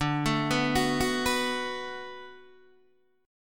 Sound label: D 6th D down Guitar Open D, chord